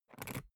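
briefcase plastic latch lock key pull out quick, Büroarbeit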